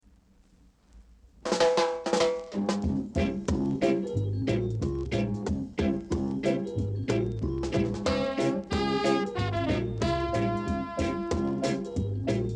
meditation

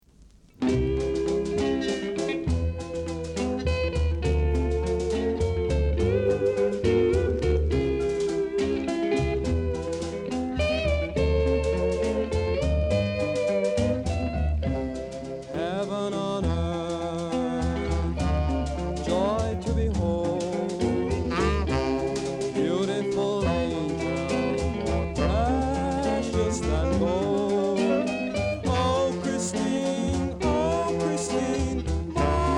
blues

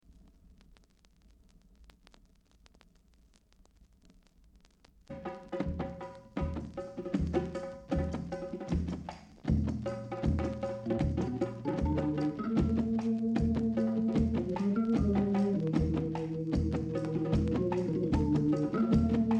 drum

